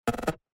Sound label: UI invalid sound